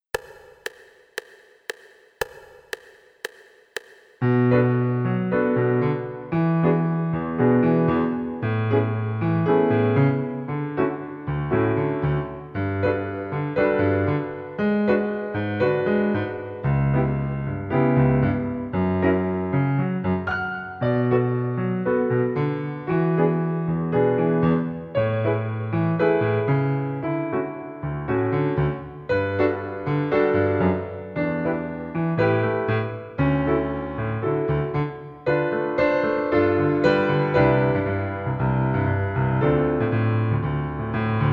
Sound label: Violin Acc.